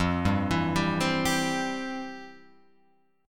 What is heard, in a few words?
F Major 7th Suspended 2nd, F, down, Guitar, Open F; arpeggiated; JGuitar